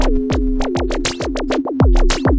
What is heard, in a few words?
glitchy zaps, drum loops and breaks, 100 bpm; 091 to 100 bpm